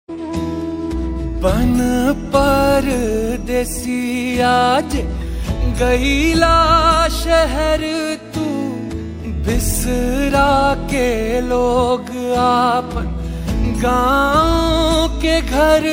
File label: Bhakti ringtone